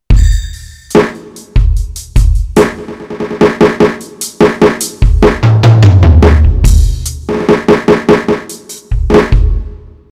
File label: Roland TD-4KP